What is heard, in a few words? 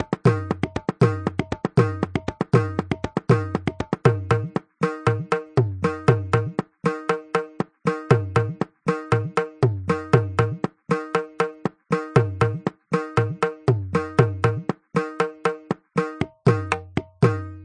MRIDANGAM, grooves